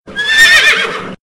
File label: Horse message tone